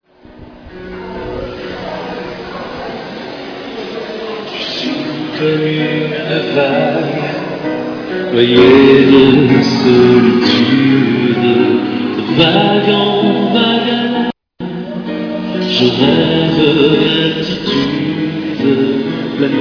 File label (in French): hymne